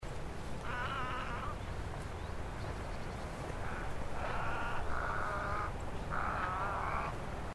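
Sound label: little egret call; kosagi nakigoe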